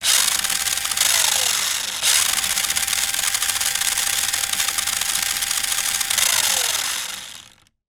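toy remote control car motor drive wheels spin air upside down; zapsplat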